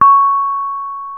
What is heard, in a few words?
reyong hi, gamelan; gravityharps